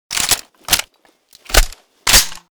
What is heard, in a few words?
mp5sd reload; STALKER